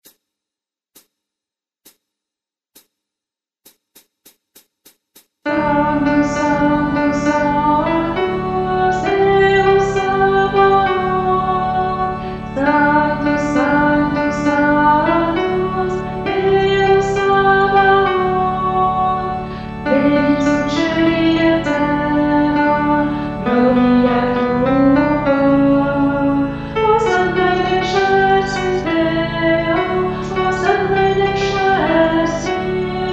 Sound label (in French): Messe de St Boniface Sanctus Guide Chant Alti